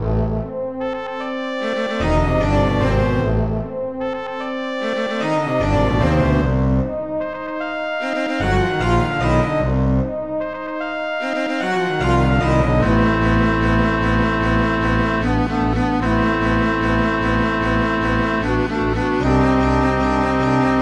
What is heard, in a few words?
still more fighting orchestral